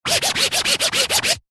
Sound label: foley fabric fingernail scrape scratch rhythmic like record scratch